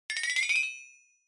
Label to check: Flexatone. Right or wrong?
Right